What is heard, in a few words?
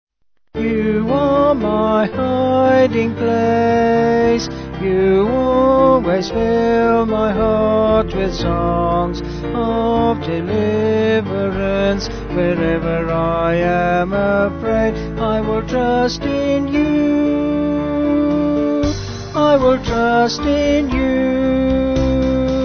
Vocal Band